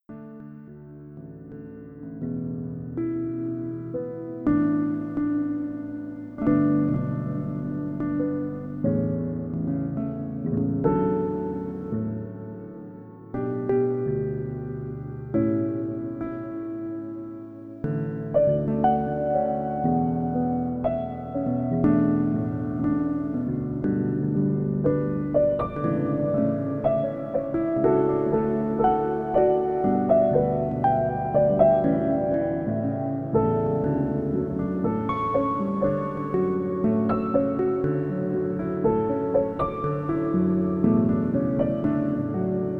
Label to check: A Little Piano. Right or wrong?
Right